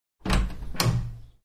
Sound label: open door lock knock